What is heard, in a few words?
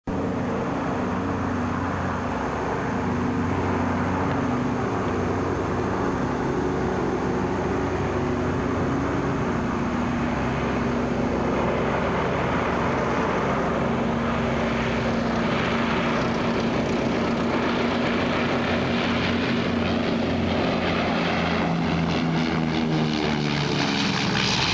S-2 Land